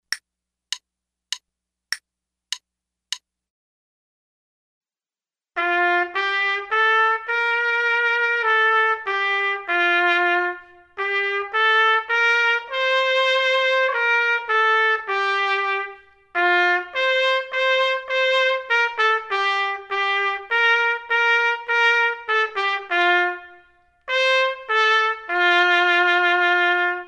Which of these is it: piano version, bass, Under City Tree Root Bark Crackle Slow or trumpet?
trumpet